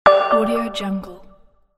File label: button menu application